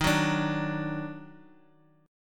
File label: D sharp Minor Major 7th